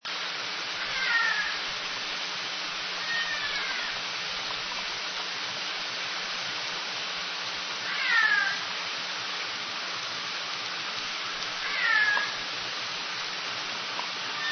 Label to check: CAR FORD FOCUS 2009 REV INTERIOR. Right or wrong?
Wrong